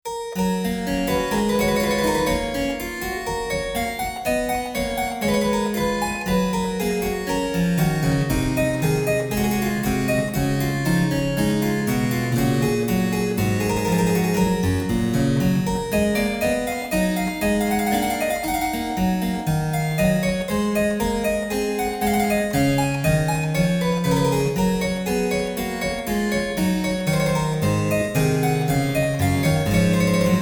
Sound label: flemish harpsichord